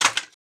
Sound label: wood falling